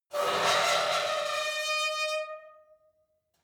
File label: metal squeal saturated; industrie-wirtschaft